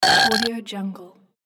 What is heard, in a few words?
burping